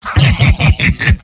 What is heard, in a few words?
m laugh evil; demoman; tf2; garrysmod; mvm